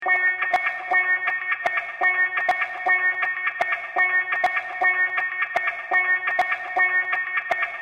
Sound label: 123bpm guitar fx; nukearts